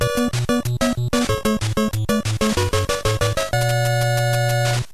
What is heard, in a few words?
stage clear; castlevania 1